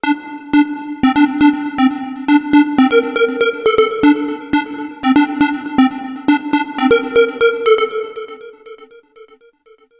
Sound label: novation peak techno lead; novation peak test